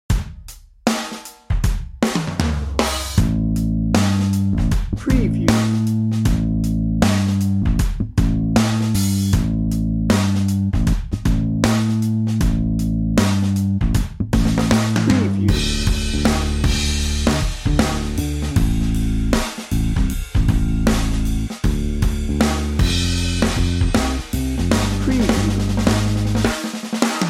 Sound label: slow fist